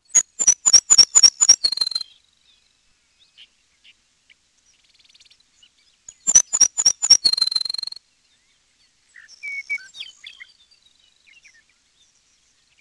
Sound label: bairds sparrow